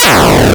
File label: Arcade Riffs